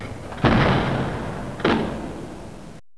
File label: door; wolf